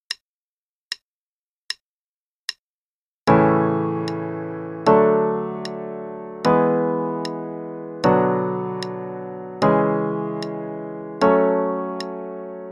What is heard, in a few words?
Primary and Secondary Chords in A Major only (hn=76); Primary first / then secondary / root position; CM Level 4; Daily Warm-ups